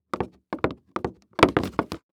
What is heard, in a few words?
Foley Footstep High Heels Shuffle On Wood; Foley Footsteps